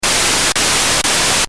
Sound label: noise; nord3; ノイズ